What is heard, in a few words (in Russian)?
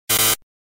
lazer